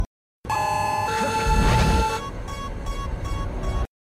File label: DRONE